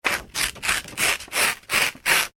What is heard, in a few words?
paper sugar ripping sections; Sheet Of Sugar Paper Ripping / Tearing In Sections; foley; Objects; McKinney Sound